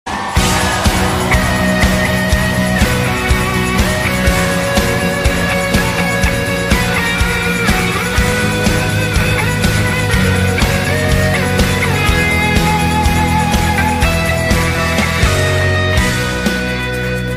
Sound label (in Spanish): cristiano para celular ringtone